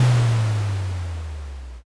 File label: distant thunder